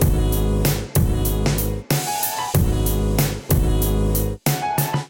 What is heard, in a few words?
Kit Melodic 94 bpm, Drums; MIDI Clips; Canvas; Ableton; Chop and Swing